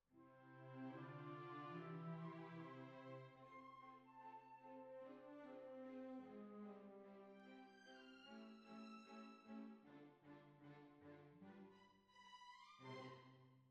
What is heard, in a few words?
Cbasses